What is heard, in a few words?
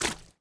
bodyfall mud